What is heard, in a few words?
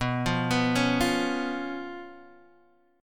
B Suspended 2nd Flat 5th B Guitar DADGAD, chord